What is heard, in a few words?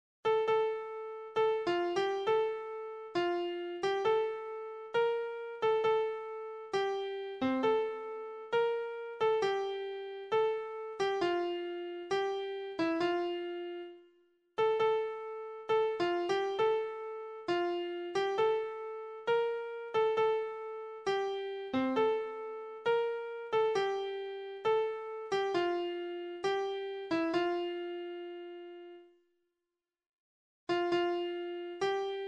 Solo piano